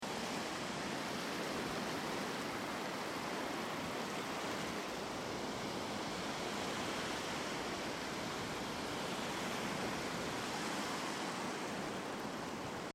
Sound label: Ocean Beach Sound Of The Sea Windy; Nature; Crafted Signals